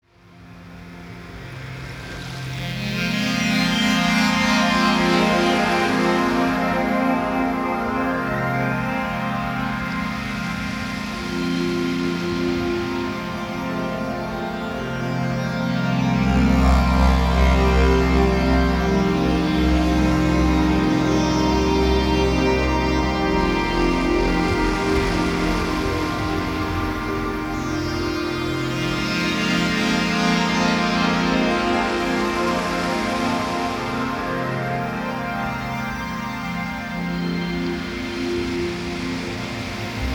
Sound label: music